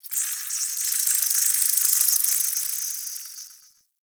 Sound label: insect buzz; Ambience; Warframe; Eidolon Plains